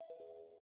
Call End

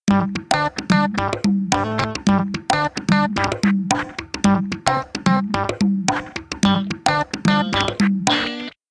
Funk, ringtones; WindCanada

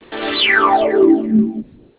shutdown; Sanyo; SCP-6400; Startup and Shutdown

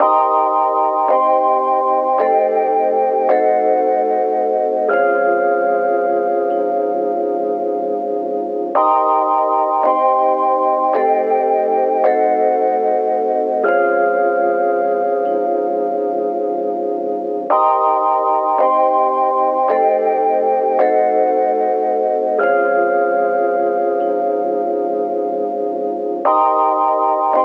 ringtone light; The Light Phone II

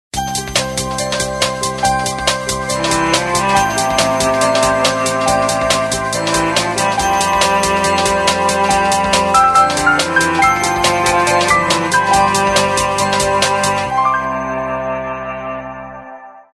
All Techie, Samsung; GT-S7330

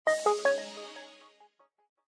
Power Off, System; LG100C

